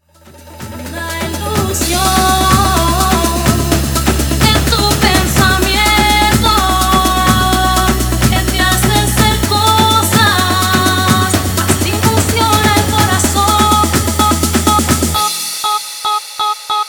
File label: afro remix 126 bpm